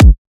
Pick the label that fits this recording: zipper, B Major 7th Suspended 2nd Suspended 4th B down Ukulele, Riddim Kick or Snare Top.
Riddim Kick